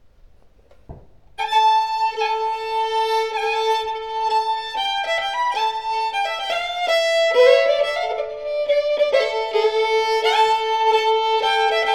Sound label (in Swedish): Runnom, Engerdal; efter Embret